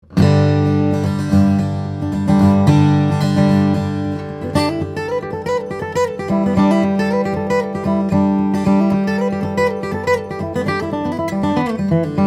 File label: Dreadnought, steel-string guitars; indian rosewood spruce; flattop